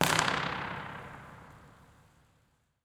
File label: Dome, Impulse Response; Square Victoria; EchoThief Impulse Response Library